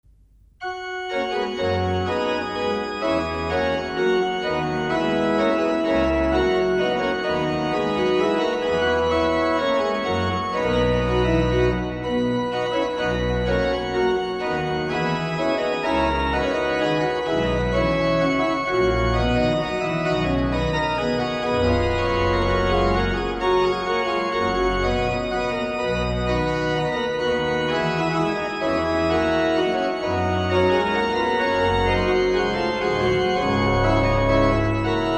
Das Orgelbuchlein Whitsuntide Komm, Gott Schopfer, Heilig, Bach Organ; Das Orgelbuchlein, BWV599-643